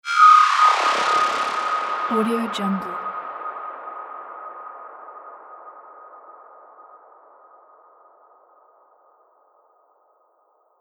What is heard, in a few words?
strange space occurrence